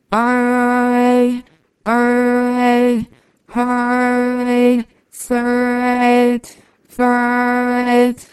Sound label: singing